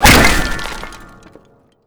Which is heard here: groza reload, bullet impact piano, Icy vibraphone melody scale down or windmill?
bullet impact piano